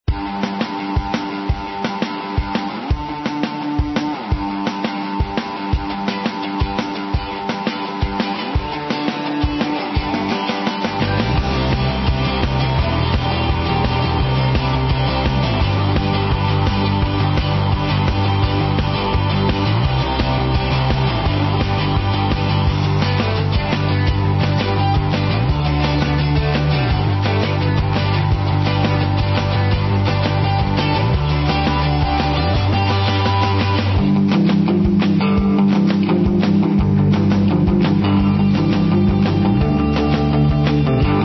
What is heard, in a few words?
Street Rock; club penguin